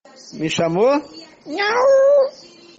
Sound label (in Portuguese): miau